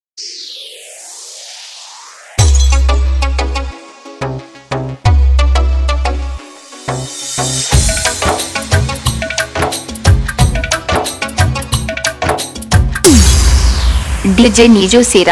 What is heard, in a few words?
Hard Bass Remix Haryanvi Dj Song